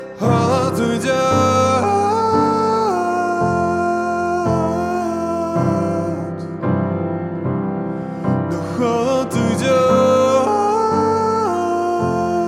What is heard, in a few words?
piano version